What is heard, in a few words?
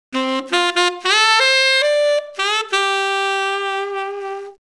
sax